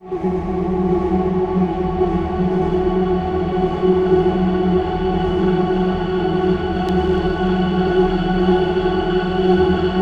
Pad; neuro; InsideInfo

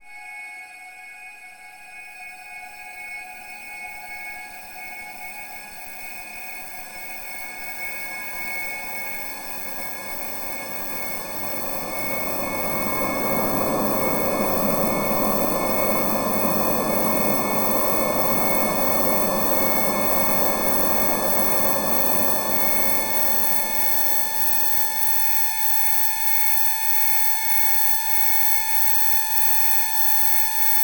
F#4 spin, spin plasma; NorthStar - The Wizard VOL-2

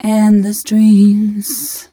f mel a flat, fem Vocals

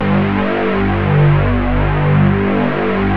SYN, analog

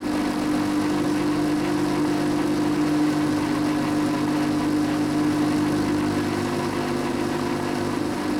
MACH, MACH N SM; Total Control - Action Cutz

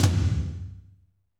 tom, Fast Rock, Kit Dry; Northstar; Drumscapes Roland

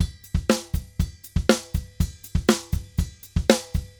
DRUMS, SALSA; World Grooves; Best Service ProSamples vol.14; AKAI

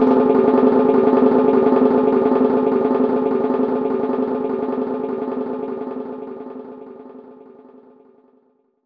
Timp Roll f; Roland M-OC1

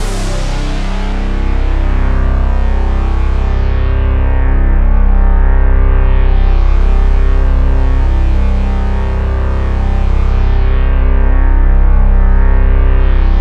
T8-SYNC, JV-1080

